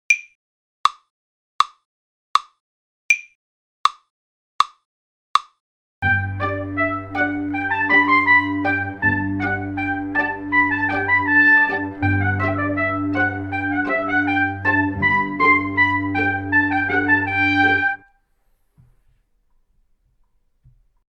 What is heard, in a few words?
Semiquaver Duet